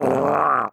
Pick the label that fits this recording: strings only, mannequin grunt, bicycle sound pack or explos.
mannequin grunt